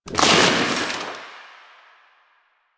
Fabric Chair Destroy; Warframe; Ambience; Entrati

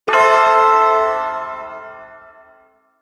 Shawzin Maj Chord I; Warframe; Duviri Ersatz Shawzin

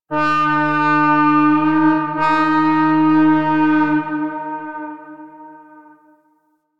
Town Horn Alarm; Ambience; Duviri; Warframe

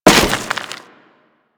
Crewman Step Dirt, Footsteps; Warframe; Corpus

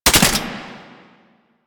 Belt Fed Rifle Mech; Weapons; Warframe; Tenno; Frumentarius

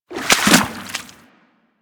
Infested Small Land Water, Footsteps; Warframe

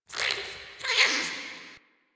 Interior Squish Gurgle; Warframe; Infested Eris; Misc Indoor

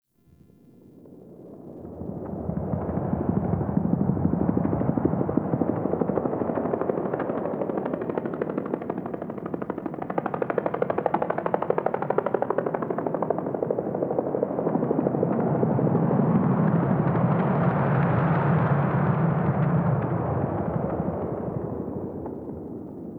Distant Ambient Helicopter

